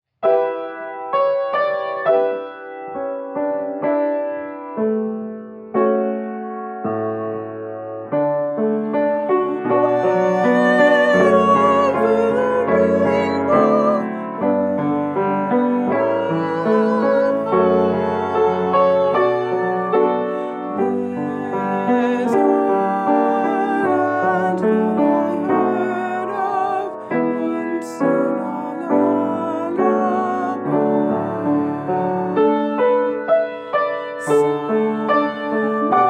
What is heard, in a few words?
Alto Piano